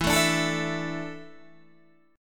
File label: E 7th Suspended 4th, Guitar, DADGAD